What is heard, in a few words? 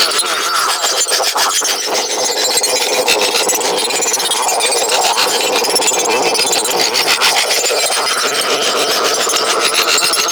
Mushroom Capture Loop; Nokko; Warframe; Nokko Colony; Mushroom Hunt